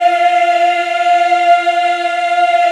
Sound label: F4, Choir Acoustic & Synth; USB Soundscan vol.28; AKAI